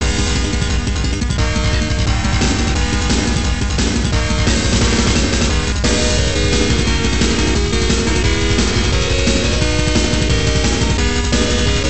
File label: Stage; Long Bridge - Linear Railway